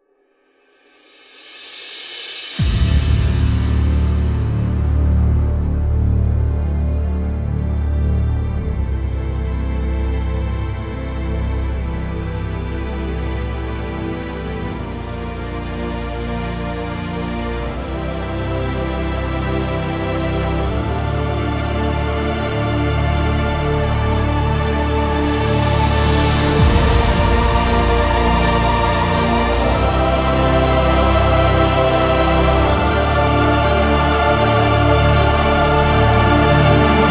Orchestral Movie Intro

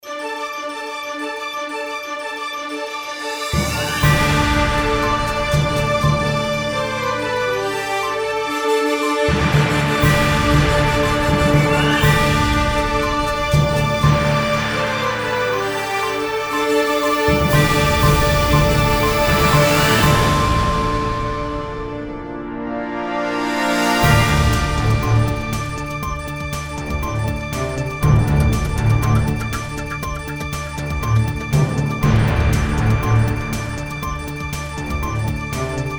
Breaking News

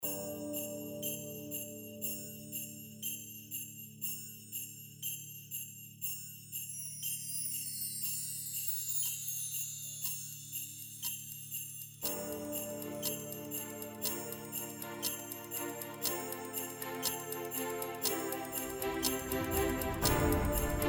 Epic Inspiration New Year Countdown

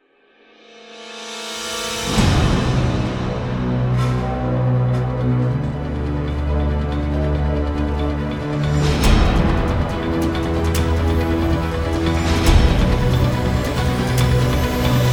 Intense Riser

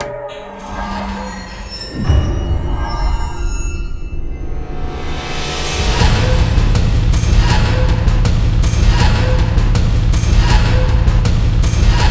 Action Movie Trailer Intro

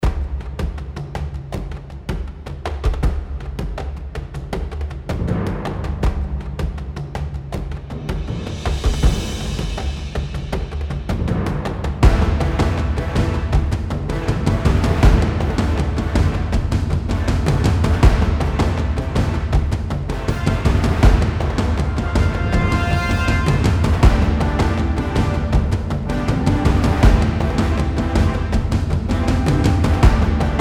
Cinematic Game Battle

